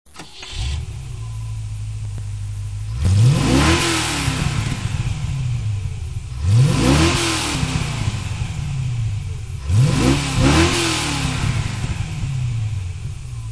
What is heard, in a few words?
revs